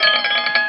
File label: Bridge Fill, Drums & Percussion; Percussion Fills; FX; Sonic Foundry (Sony Creative Software); Futurist Drum & Bass